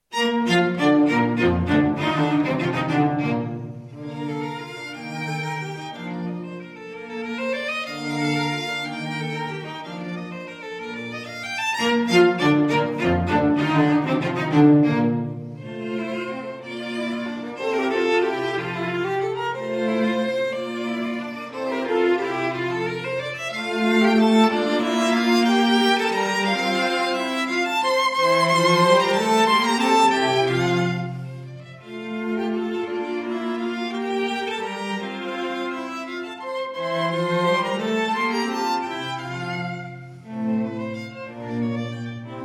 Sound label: String Quartet In B flat Major